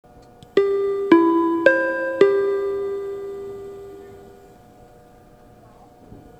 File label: chime; tobutetsudo